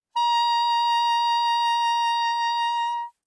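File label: Soprano Saxophone vibrato ff Bb5; Woodwinds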